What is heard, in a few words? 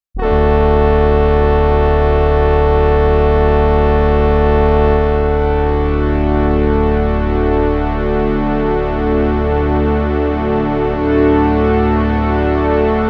Nord Stage Pad Detune